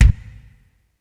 Bassdrum, Percussion; Giga Samples Collection; ELQ-DrumQit

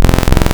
Fractal Saw, wavetables; Architecture 32

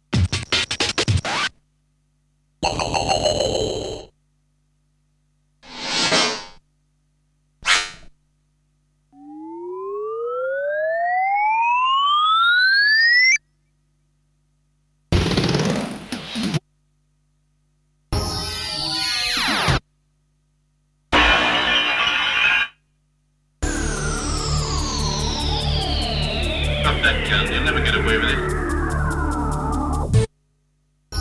Sound Effects; Kniteforce; 1000 Samples Volume 1

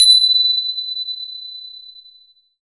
percussion bells

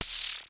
REZ NOIZ, Klone Dual-Percussion-Synthesiser; KLONE NOIZ NW8

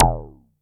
JNG BASS, Yamaha DTXpress

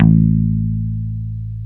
BASS, A 2

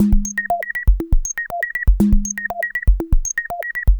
Percussive

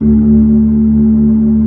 B-3, Keyboards; Keyboards of The 60's and 70's; Full Xfade

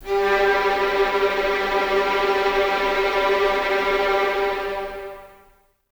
VS G 3 Tremolo, 23 Violins; Miroslav Vitous

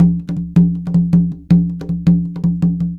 Conga, Latin Percussion

